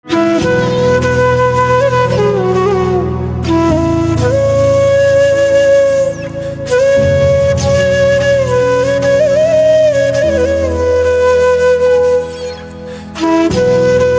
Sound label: Flute Slow